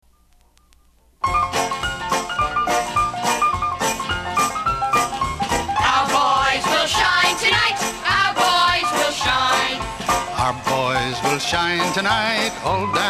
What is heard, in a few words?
Campfire Medley